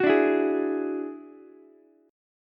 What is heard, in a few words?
F sharp 7th Suspended 2nd Sharp 5th F sharp down Ukulele; strummed